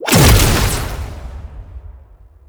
Safe Zone Activate; Warframe; Nineteeen Ninety Nine; Deep Archimedia

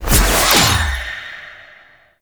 Choir Pool Cast

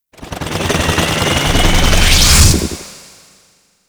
Drone Connection; Warframe

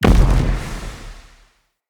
Casting Device Projectile Destroy Heavy; Weapons; Player

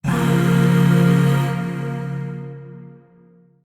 Shawzin Hirajoshi Chord E; Warframe; Zariman; Void Shawzin; Chords

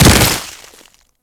Kubrow Land Snow, Footsteps; Enemies; Warframe

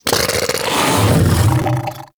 Vocal Burp Attack

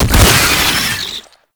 Latch Detach; Roathe Summon Gulphagor; Warframe; Tau

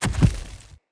Corrupted Battle Dog Land Step Heavy, Footsteps; Animals; Foley

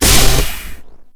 Door Open; Galba

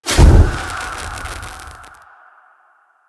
Blaze Armour Impact; Powers; Fenn

